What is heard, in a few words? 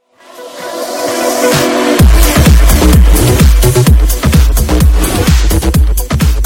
new sms